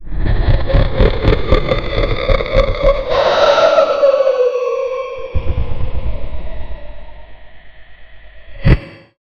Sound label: ferocious demon laughter